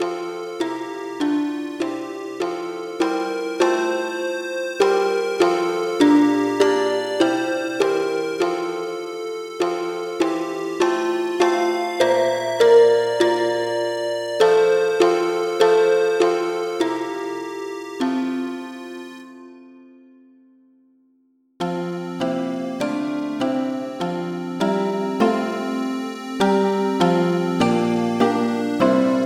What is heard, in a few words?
polyinstru; hymns; Himnario grande